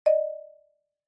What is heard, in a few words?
Xylophone Multi